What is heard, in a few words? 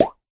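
popcorn single short classic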